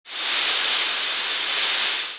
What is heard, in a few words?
bs catering